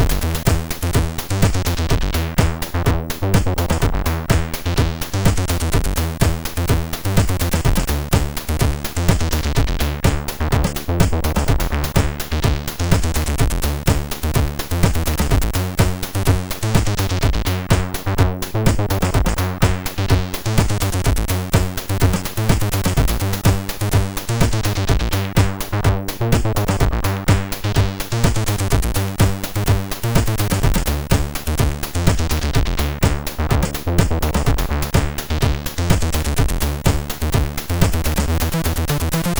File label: Rave, sid MOS6581R3